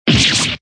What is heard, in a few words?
surprised; juniper